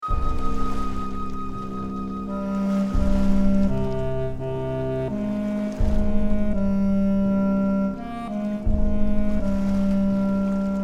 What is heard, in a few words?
audition minus voice; Junior